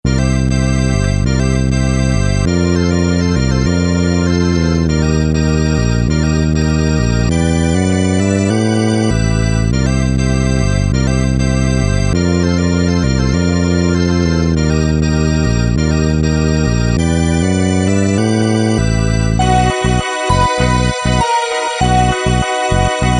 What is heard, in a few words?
All Clear; Dig Dug Arrangement; arcade